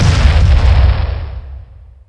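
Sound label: explosion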